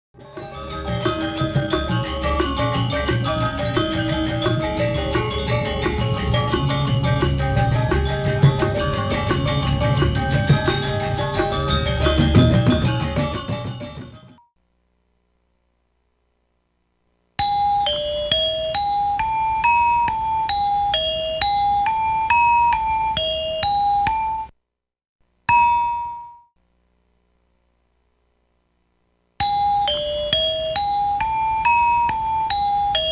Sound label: Gamelon Orchestra Examples